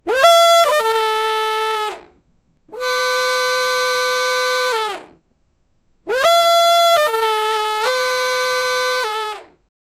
cartoon clown horn sound

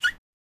object manipulation

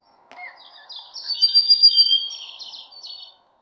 cheerful park ambience wi

